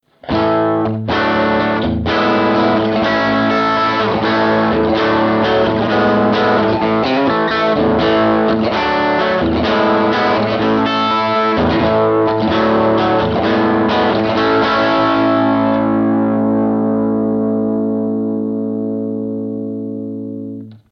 bn crunch

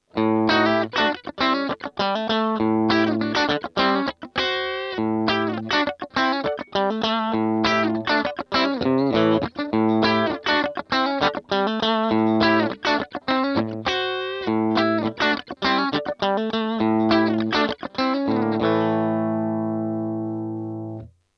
tele bridge

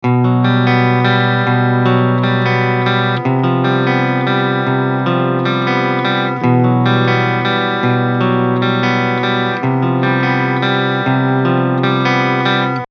eclipse active krank bridge

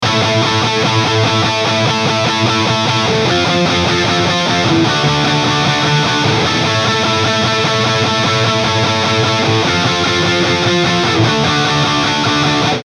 BRIDGE, eclipse active 5150

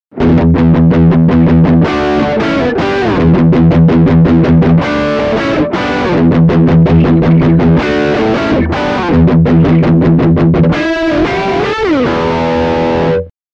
PAF Neck Drive

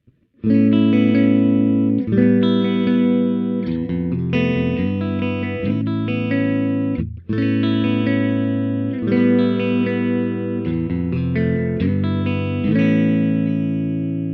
neck bridge, mp90

